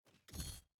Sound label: building destruction stinger